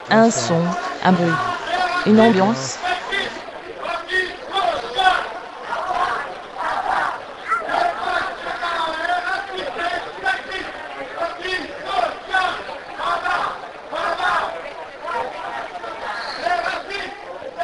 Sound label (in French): Un son un bruit une ambiance